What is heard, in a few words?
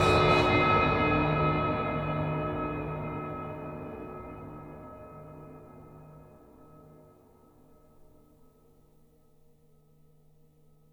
ANVIL STRIK; Distored Reality